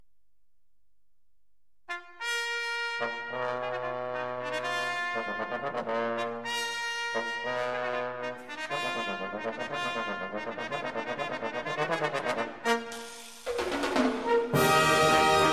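double concerto for bass trombone trumpet and band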